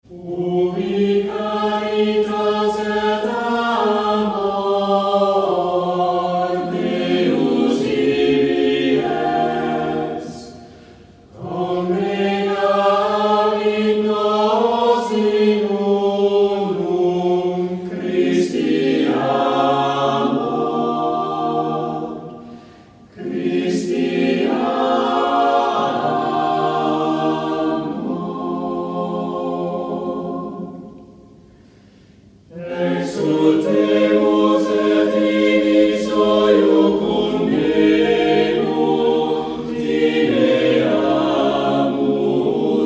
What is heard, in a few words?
Ubi caritas